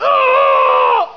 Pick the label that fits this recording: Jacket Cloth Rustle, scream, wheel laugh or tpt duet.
scream